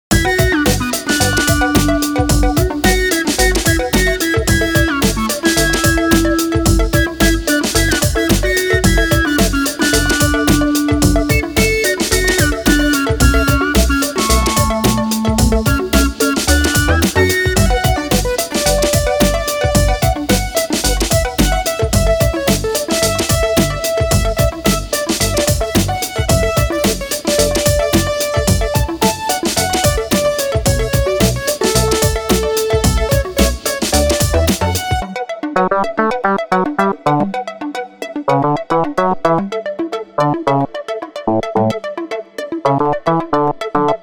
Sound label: Disco, EDM Pop